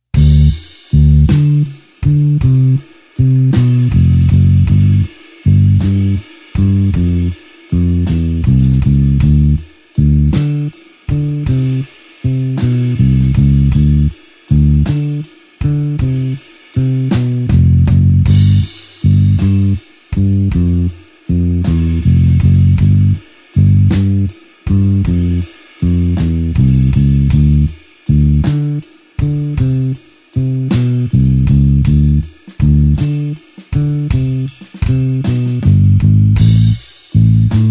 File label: blues bassline slow D